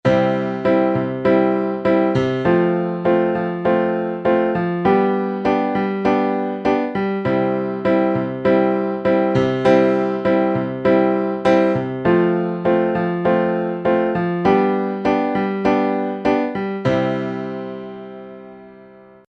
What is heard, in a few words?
Progression I IV V I, Diatonic Chord Progression